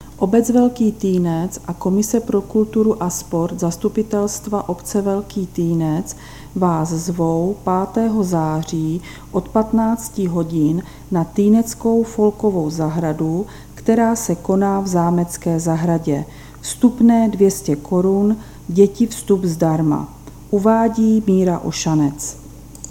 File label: folk